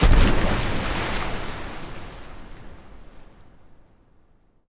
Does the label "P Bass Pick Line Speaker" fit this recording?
No